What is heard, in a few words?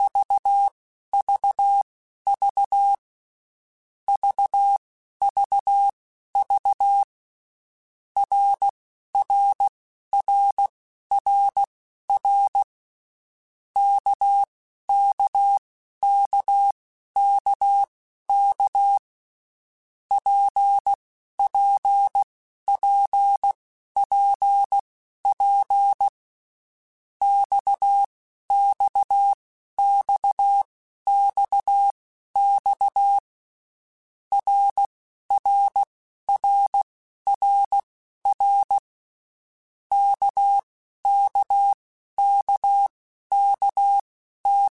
RKPX, Morse Code; CW Code Groups; Basic Letter Groups By7Groups; HamRadio; Basic Sets